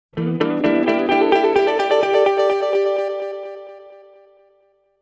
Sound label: Nokia Tones; Windows Phone apps; Leap